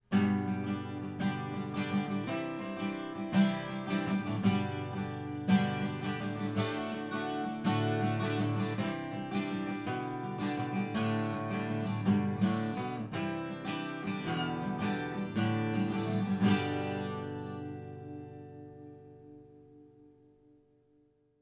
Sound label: Side Guitar; Four couples of microphones have been placed in front of some instruments